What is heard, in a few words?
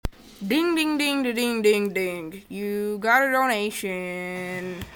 ding ding ding da dinger